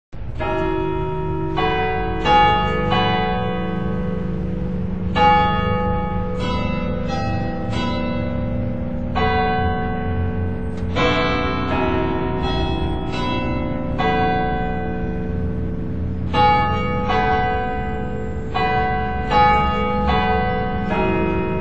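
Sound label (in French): ducasse, carillon; jumet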